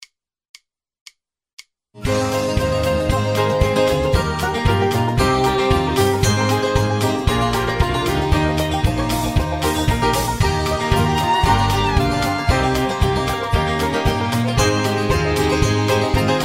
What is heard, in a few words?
High Key